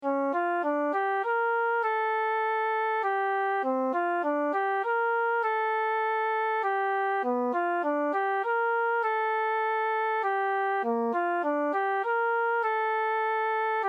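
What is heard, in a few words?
improvisations flute; 3 improvisations 2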